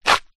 footstep scuff gravel dirt; foley

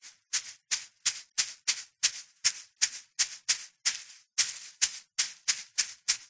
bird nest pecks building hay bushy; zapsplat

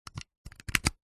technology usb a cable plug in; zapsplat

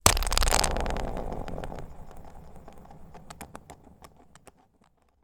twister game spinner spin

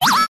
robot servo motor mechanical whirr fast movement; science fiction

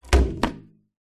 musical drum tom set down

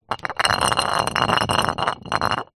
household jar glass thick empty roll concrete

